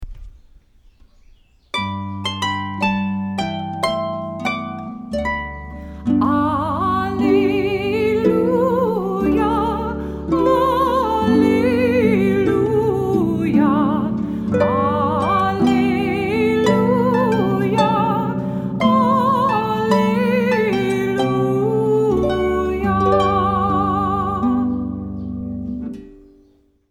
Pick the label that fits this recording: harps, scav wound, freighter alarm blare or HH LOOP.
harps